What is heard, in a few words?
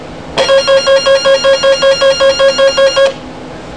Missile Emergency